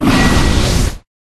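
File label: Dragon Skeleton block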